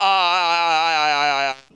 ahyayayayaya, Voice; ooh ahh

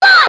"ah"; ooh ahh

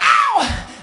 ow

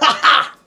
"ha ha"; Voice; ooh ahh

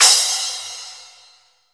Cymbals

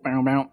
bowm bomp, Voice; ooh ahh

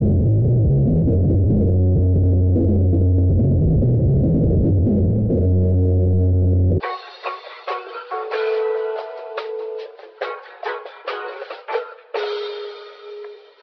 keys, jazz loops

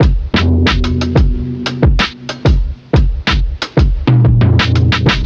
Drum loops, Funky

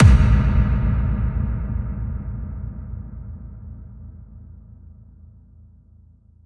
electric explosion; Crash Bang Boom

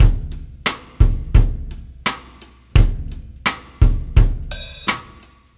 Drum loops, Boombap

